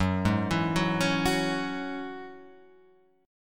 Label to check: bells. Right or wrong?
Wrong